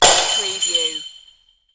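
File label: Plate Break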